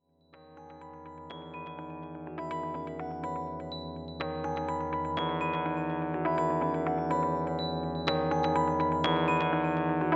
electric microtrack

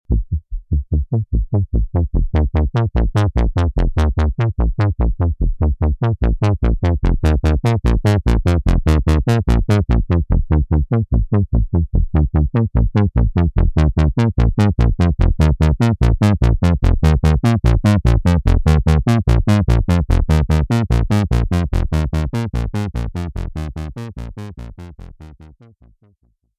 Thick and Wet Bass Synth

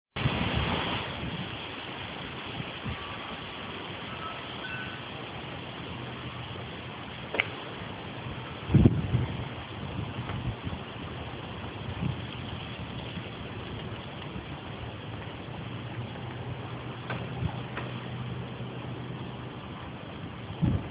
lofi storm ambiance

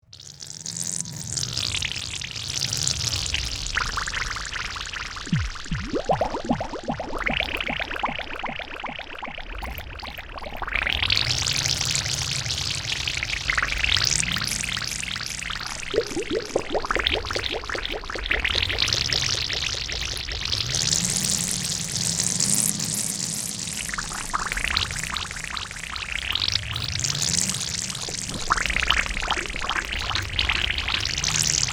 dub delayed atmospheric bubbles

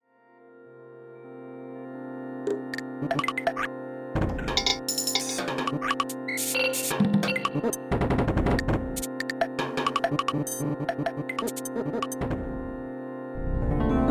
drums to pitches

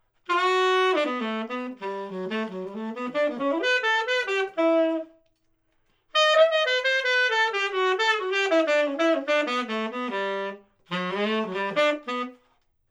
sax

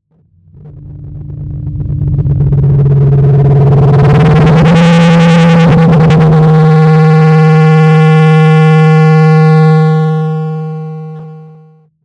rhodes feedback

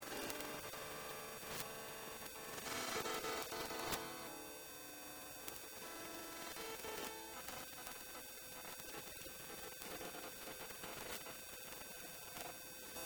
ipod garbled piano

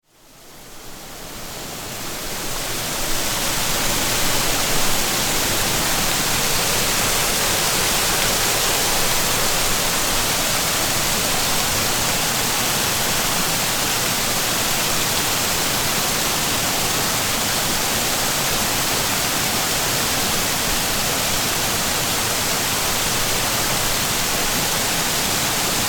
shingle creek

